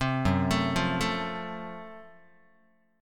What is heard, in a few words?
Gb Minor Major 7th Flat 5th Gb down Guitar; arpeggiated; 3,2,0,3,1,x; JGuitar